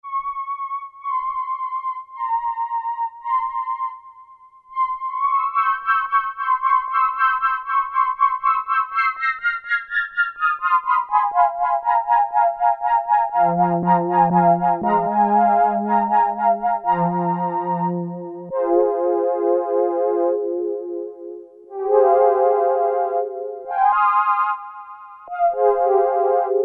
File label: Lunar Flutes, Korg Poly-800